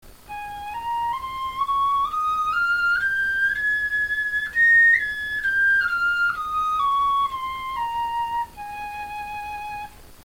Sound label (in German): tonleiter, knochenfloeten